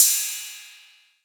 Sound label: Royality free single cymbal hit tuned to the D note. Loudest frequency: 8156Hz; cymbal D key